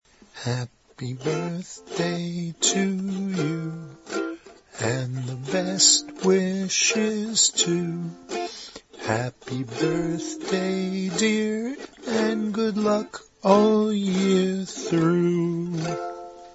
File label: happy birthday to you with name alternative song